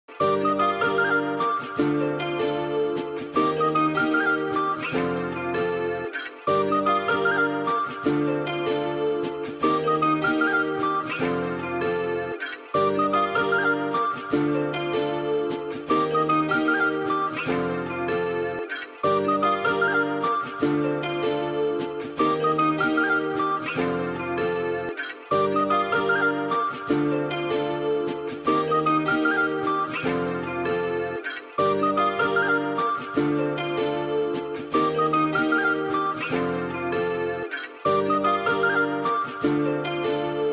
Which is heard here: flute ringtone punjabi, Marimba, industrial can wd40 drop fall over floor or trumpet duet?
flute ringtone punjabi